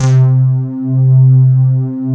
BRIGHT POLY; Pro-Rec - Synthsonic; TRINITY

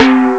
pauke; Amiga Soundtracker Sample Packs

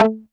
TEARDRP1 A3, Yamaha VP-1; Music Store

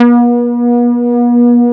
ESQ 1 MOOG, Synthesizers; Soli-Music; World of Synthesizers VOL-1

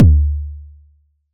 Toms Medium

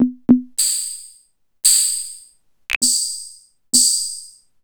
Maestro G2

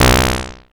ZAP DRUM, MACHINE PERC; Zero-G Ambient 1

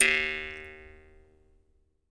jaw harp, Pitched Percussn, Jaw Harps

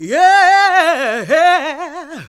GOSP. Singin

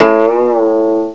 koto bend; CMI Sound Library Rev 1.3; GUITARS

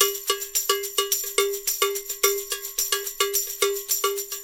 Percussion, 108; Sampleheads; NYC Percussionworks; 90 s Sample CDs

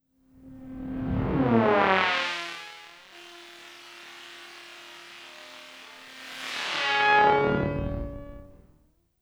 Angry Wasp, Weird; Zero-G Ambiosis

